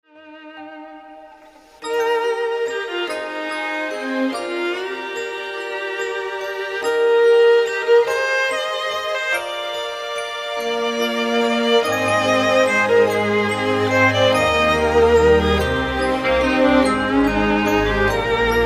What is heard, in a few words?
Music Box Lullaby; Melodia